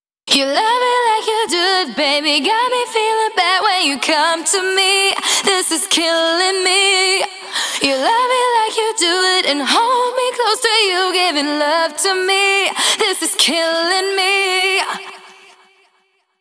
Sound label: Female Vocals Chorus WET, 128 BPM; Vengeance Samples; VTDS2 Song Kits